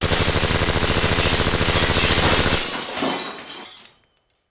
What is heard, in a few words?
Gun, Machine, Fire Through Glass; gun and weapon sounds; captain audio; fully loaded